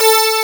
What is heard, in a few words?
DG WAVE, DIGI SYN